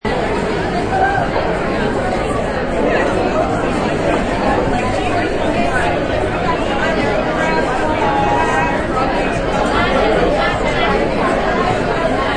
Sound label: Restaurant Ambience, Crowd and dining noise in busy restaurant.; Backgrounds; Restaurants